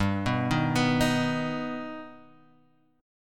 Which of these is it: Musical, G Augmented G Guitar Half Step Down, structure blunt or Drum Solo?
G Augmented G Guitar Half Step Down